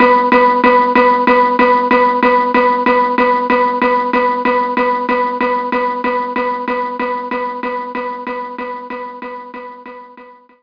steel drum B4

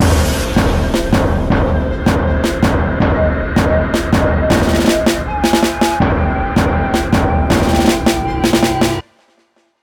Victory Short; Hyper 5; ps4; xbox series xs

